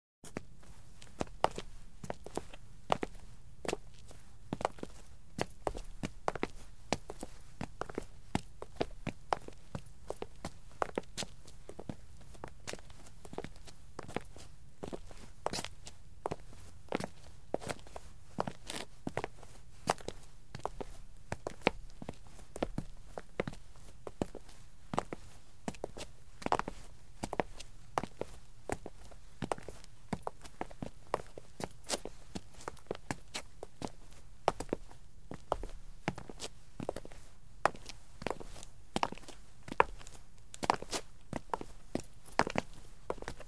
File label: Steps of two people on the concrete floor in shoes